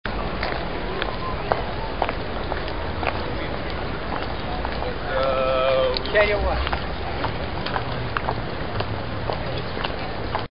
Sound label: City Sidewalk And Pedestrians